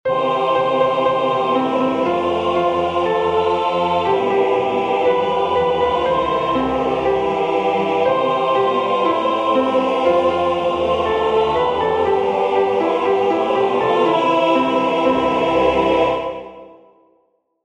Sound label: Birds Sing Bass Voices